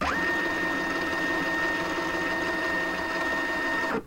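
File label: document scanner fast search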